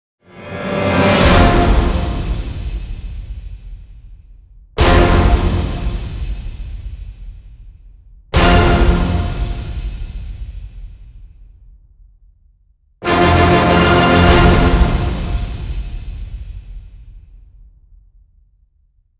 Orchestral End Hits (F); Epic Tools vol. 1

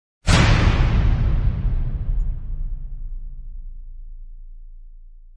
Time Strike; Gothic Storm Music

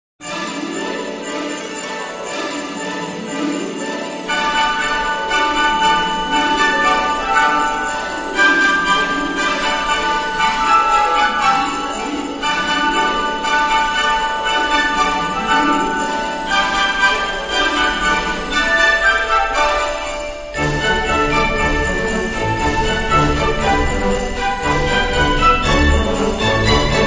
March of the Jingle Bells

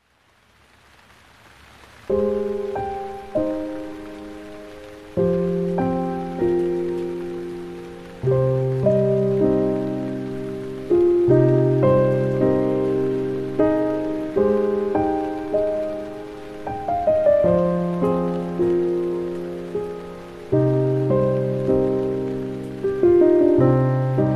Piano (With Rain)

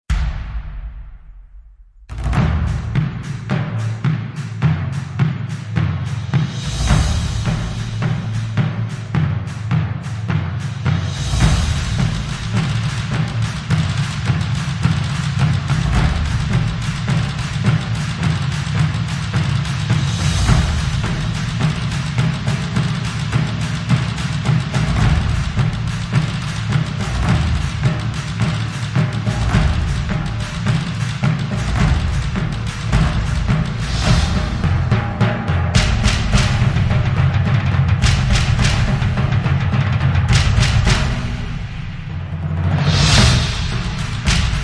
Drums Only Version

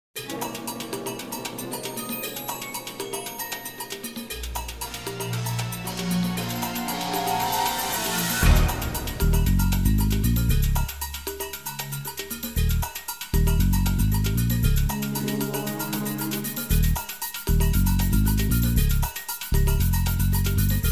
Techno Latin

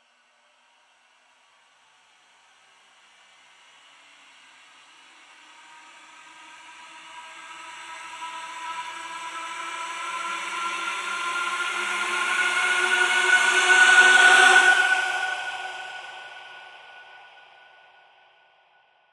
Risers Expander; Gothic Storm Music; Advanced Sound Design 2012

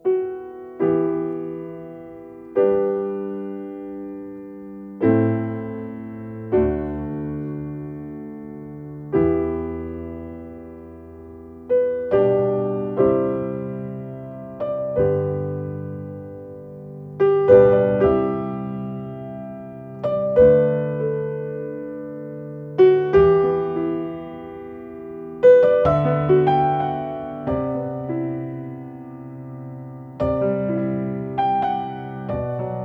Skin Horse (Without Narration)

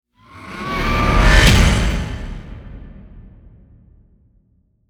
Revved Hit Swell; Ninja Tracks

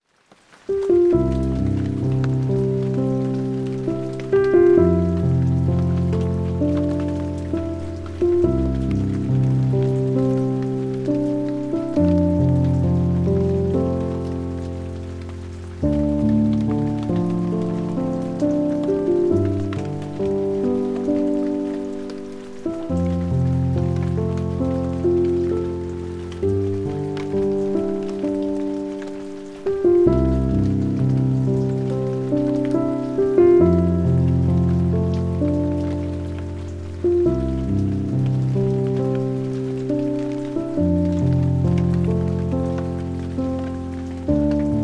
Piano (With Rain), Calming Piano Music with Rain

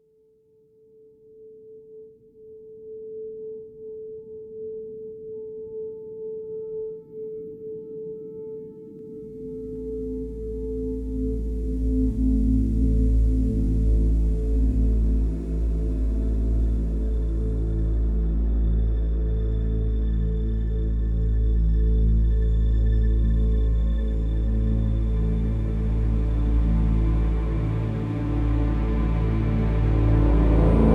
Attrition (No Synth Pulse); Ninja Tracks

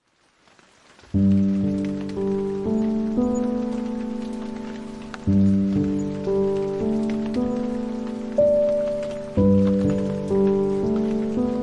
Baby Lullaby With Rain, Piano

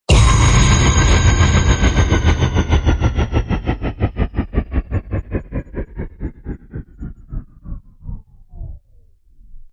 Hit Downer; Ninja Tracks

